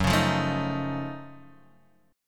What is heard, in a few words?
Gb Minor Major 13th, GbmM13 chord; strummed; JGuitar